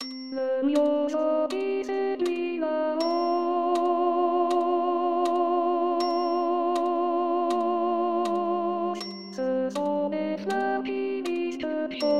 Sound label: Soprano, Chorale